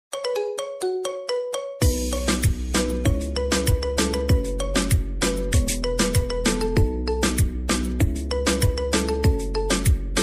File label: marimba remix ringtone